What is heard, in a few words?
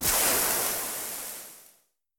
Cart brakes; adams venture origins